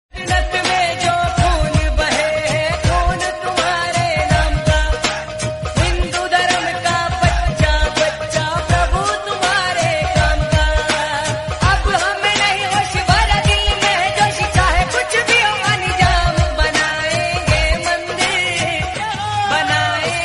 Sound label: hindi bhakti song